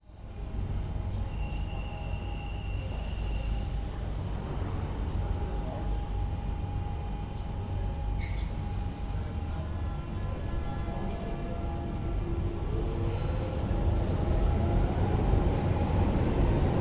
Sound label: queensway underground station eastbound train approach departure